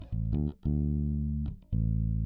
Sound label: Bass DI